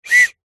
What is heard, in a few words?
foley whistle short toot